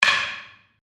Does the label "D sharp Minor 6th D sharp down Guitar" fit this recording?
No